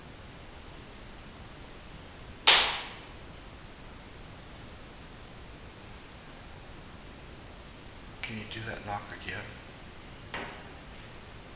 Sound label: Knock